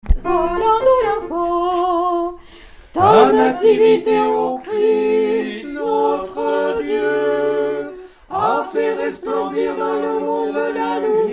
Tropaire Noel ensemble

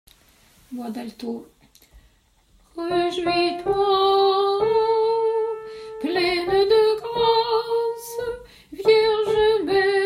Tropaire sainte Rencontre Ton 1 Alto

